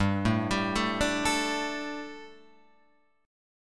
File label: G Suspended 2nd G, down, Guitar, Open F; Gsus2 arpeggiated; JGuitar